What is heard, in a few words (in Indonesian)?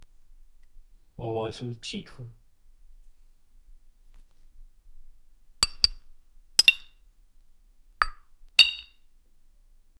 buka tutup botol kaca, cap